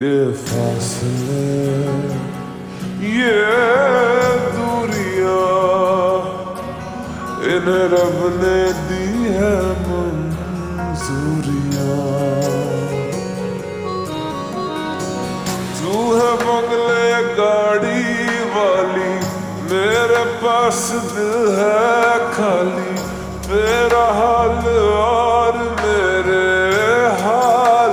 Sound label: Ringtone slowed reverb